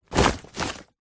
industrial tarpauling bag step on tread foot

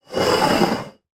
sugar container slide across worktop

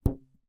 hair elastic band twang; foley

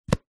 household phone cell case flip close

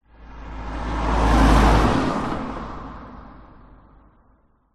car mercedes c220d pass by

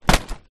food popcorn paper bag full down on surface

